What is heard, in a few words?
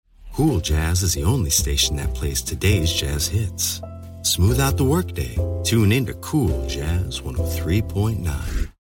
cool jazz